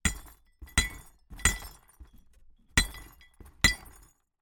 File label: impact glass bottle hit smashed glass debris in cardboard box multiple times